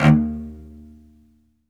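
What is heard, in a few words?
solo cello, strings; ILIO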